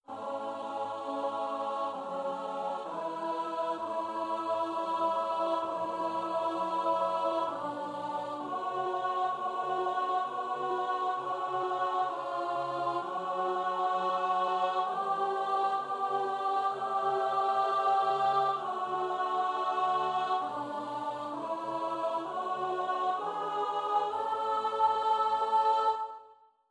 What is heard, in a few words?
Psalm alto